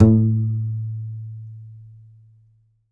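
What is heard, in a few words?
contrabass balalaika, Plucked Strings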